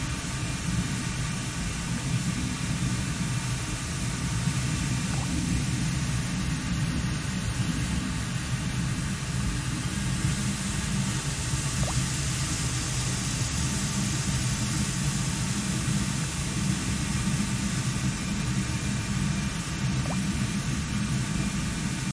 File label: Steam; HearingUtilities